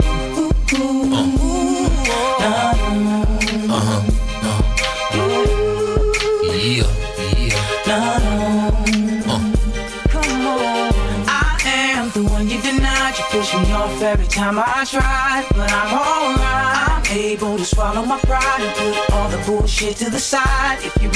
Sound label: Beat; Bridge Music Winter 2018